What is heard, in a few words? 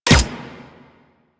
Energy Rifle Fire; Warframe; Weapons; Energy Rifle Vandal